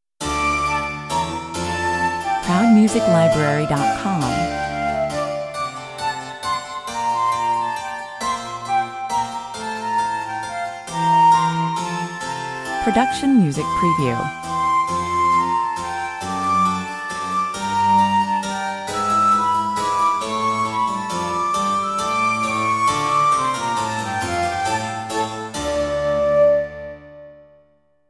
baroque flute; Just that - a wonderful baroque flute

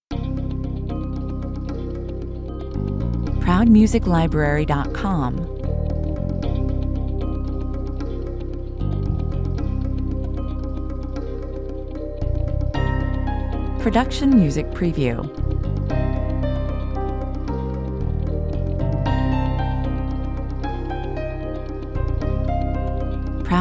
114 bpm

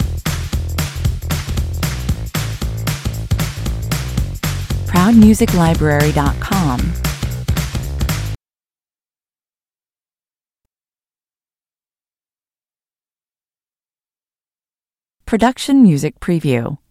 rock me indie (loop)

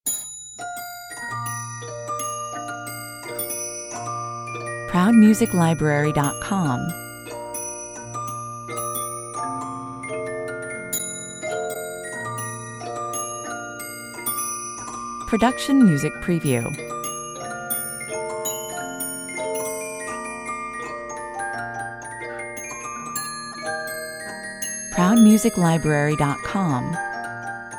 gentle lullaby music box theme; 1860 französisch Spieluhr mit versteckten Glocken

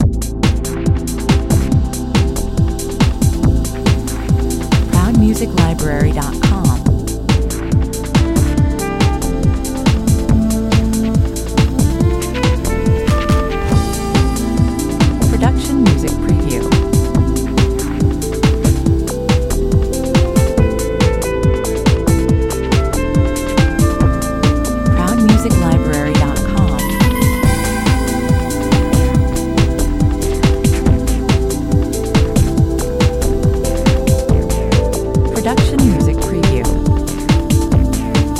no guitar, no vocal, 140 bpm

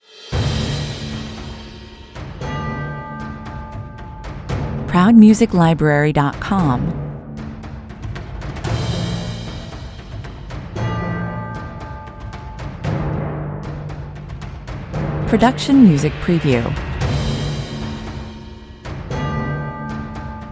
percussion mix high intensity

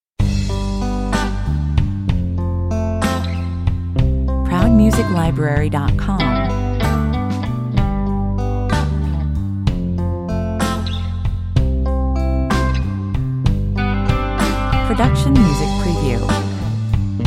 beautiful ballade 95 bpm

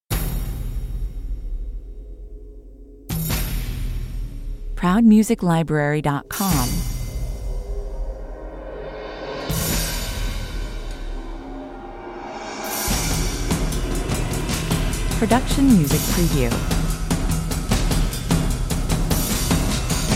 percussion only

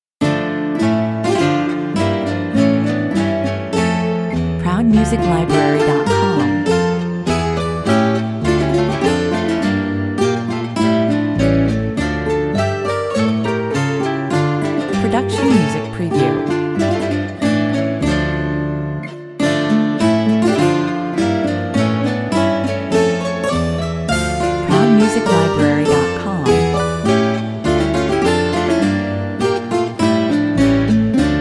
Baroque lute music, short and sweet, 106 bpm

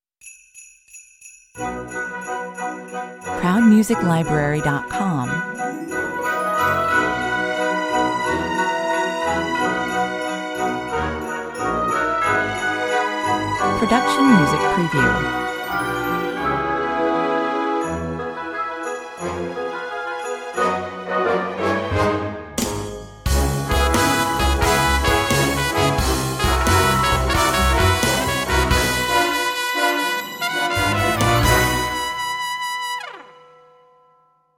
merry christmas jingle 120 bpm

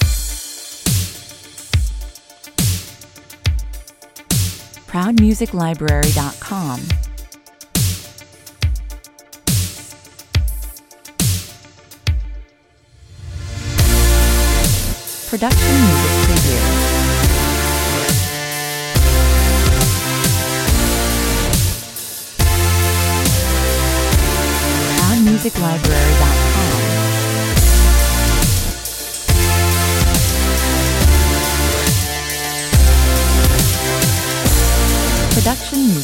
trip step dub step

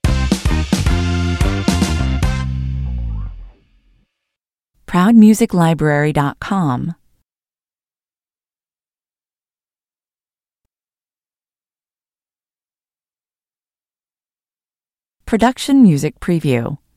funky stinger

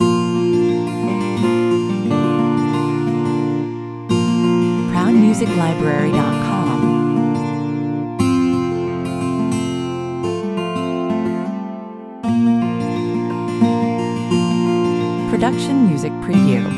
(acoustic guitar version) 88 bpm; Stille Nacht, heilige Nacht – der Weihnachtsklassiker als Akustik Version mit einer einer gepickten 12 String Gitarre

